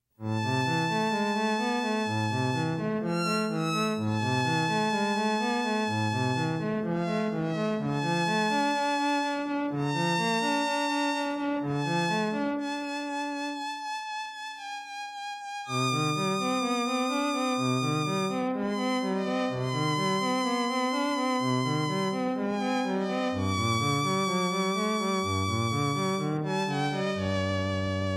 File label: piece for violin and cello